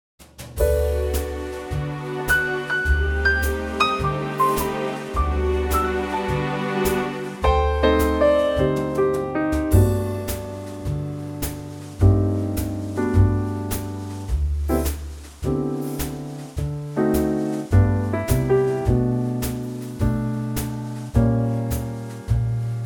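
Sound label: G orchestral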